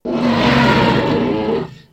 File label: Dinosaur Growl Shrt